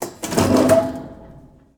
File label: GATE Close Metal Small; mono; Universal Sound FX; DOORS & GATES